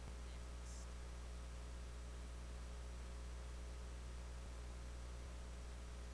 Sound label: camera